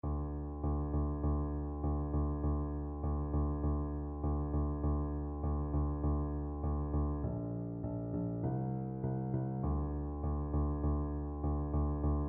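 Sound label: viola karaoke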